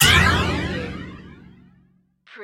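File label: Production Element Hit Flange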